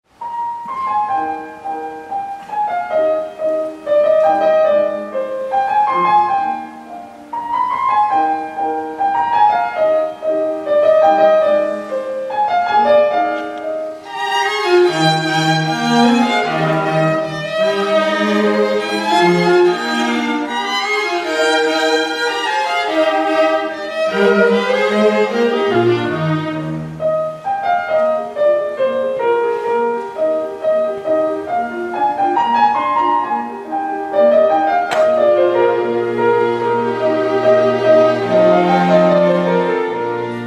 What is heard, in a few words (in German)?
Klavier - Quartett KV 493 - Allegretto; Mozart